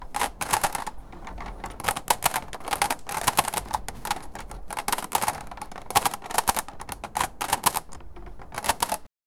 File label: cash register kaching